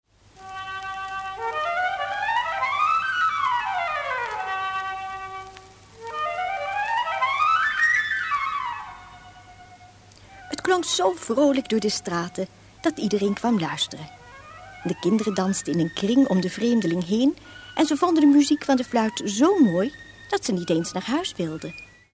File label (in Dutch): fluitspel; rattenvanger